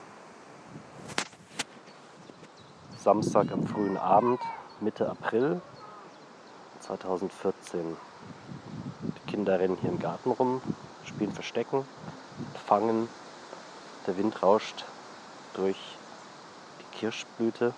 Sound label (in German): wind im schlossgarten